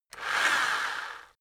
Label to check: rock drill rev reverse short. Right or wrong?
Right